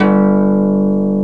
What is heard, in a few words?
HARP KARPLUS